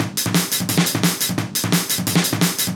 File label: DNB BREAK; m8 breaks